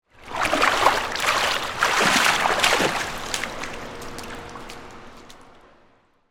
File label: Pool Indoor Exit, Water, Splash, Stairs, Exit, Indoor, Pool; Pools; Backgrounds; BlastWave